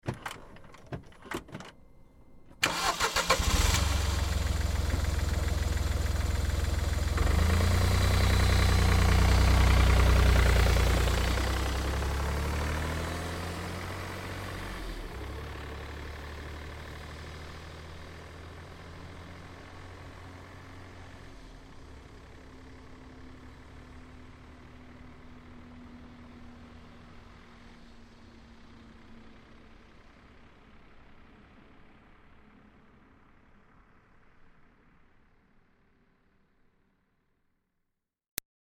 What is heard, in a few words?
Car Smart Car Start Away Slow; 2005 Smartcar Fortwo; Vehicles; Big Room Sound